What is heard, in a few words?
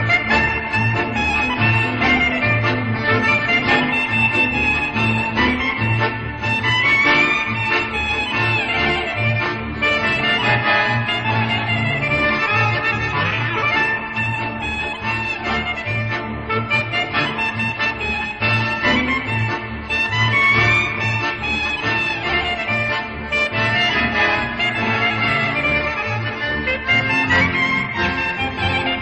Wedding march